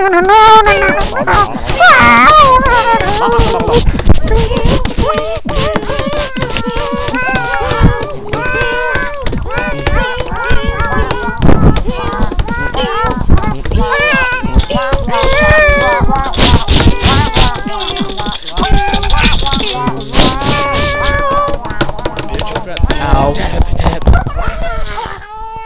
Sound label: master beat